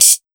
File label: HHO, Big Beat Drumloops; USB Soundscan vol.25; AKAI; MOVE 138